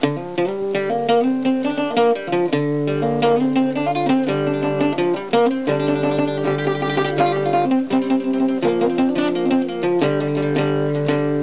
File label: ruan